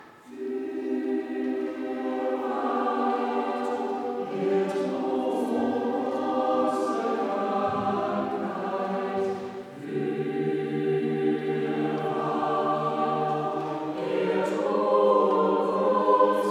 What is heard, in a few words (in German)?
Chor; Fuerwahr er trug